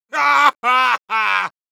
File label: laugh happy; Heavy